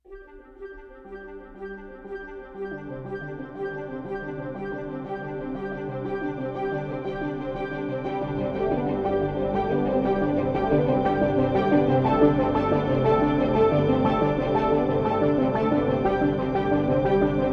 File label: Ambient